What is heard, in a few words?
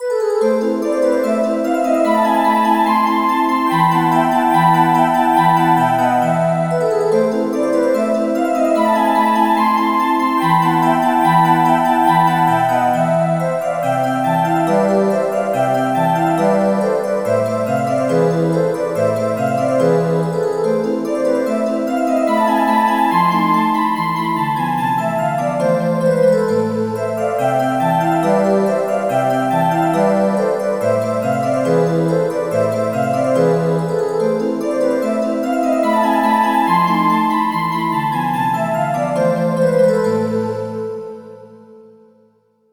space voice